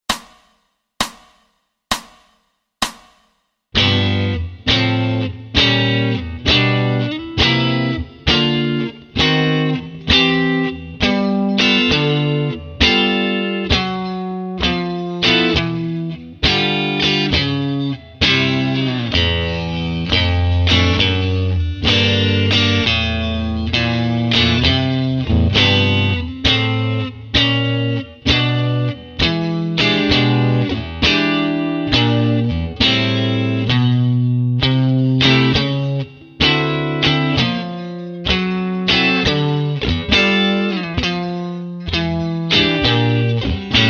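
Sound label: WALK BASS LINES Jazz Blues slow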